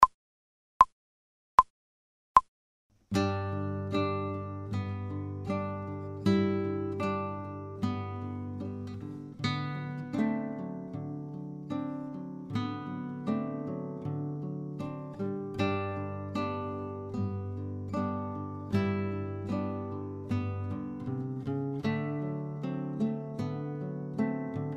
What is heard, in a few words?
Camptown Races slow, guitar; without metronome